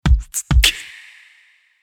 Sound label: Beatboxr